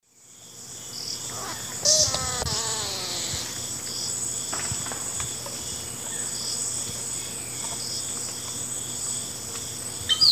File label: Machaeropterus striolatus, song; S. America; Eastern Lowlands